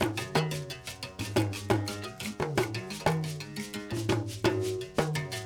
Combination, World Percussion, Xylo, Drones, Shakers, Talking Drums, Stick Drum